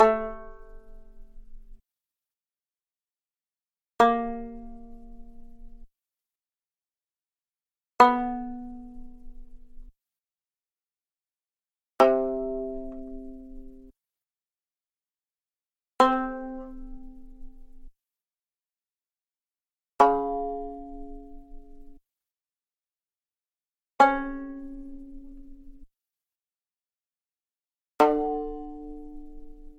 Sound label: banjo